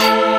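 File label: synth chord; Best Service Gigapack 1; SYN 09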